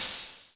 HI HAT, DANCE

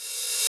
Rev Hat; 300 Drum Machines; E-MU MoPhatt

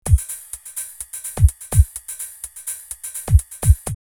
909 Break 126, drumloops, DrumMachines; X-Static Goldmine

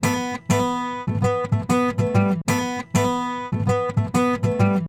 Traditional Guitar 098bpm E, African Guitars; Quazibeat - African Secret

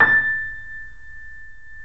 3D Pianos Yamaha C7; E-MU Producer Series Vol. 5

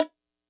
kit, Yamaha Motif-ES8

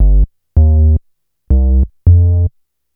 Bass, Futurist Drum & Bass

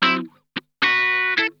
GROOVIN, Funk Guitar; Zero G - Funk Guitar

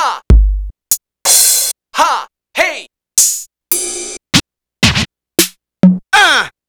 Roland CR-80

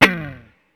Gated Slide Down, Ensoniq ASR-X Pro

